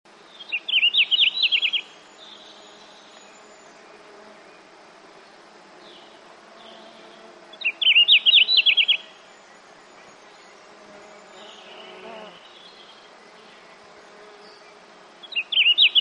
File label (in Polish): słowik modry - Luscinia cyane; ptak dotychczas w Polsce nieobserwowany; wroblowe; turdidae